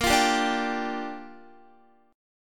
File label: Bb 6th Bb, Guitar; strummed; x,13,15,12,14,14